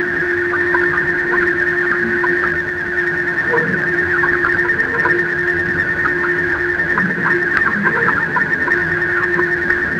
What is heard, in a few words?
space